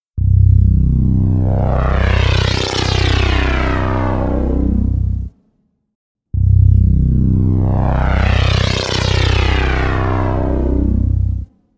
Benjolin

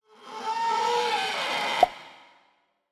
Screetch Pop

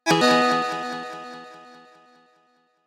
Retro Game Sounds

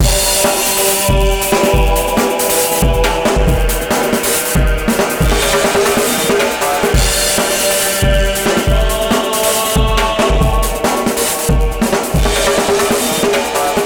Scary Break Loop

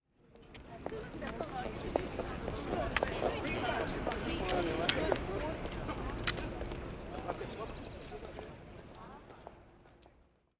Street Noise

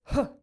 Jump groan

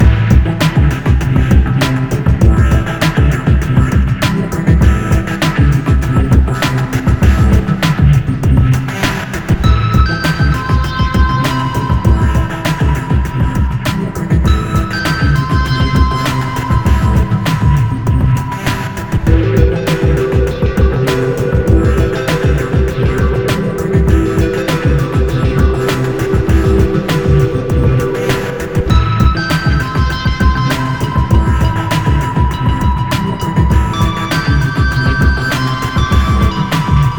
Underground Beat

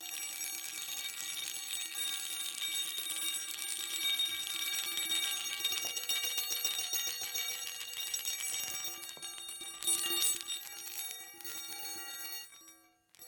Qi Gong Balls

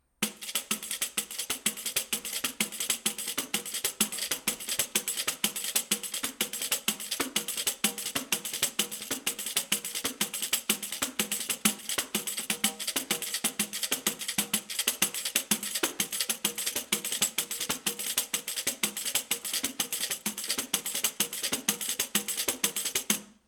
Pandeiro

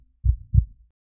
Heartbeat

